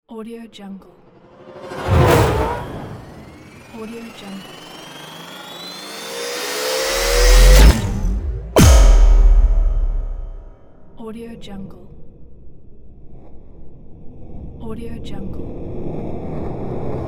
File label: cinematic trailer sound pack